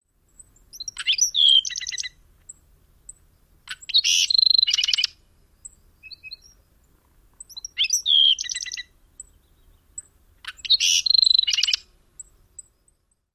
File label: songs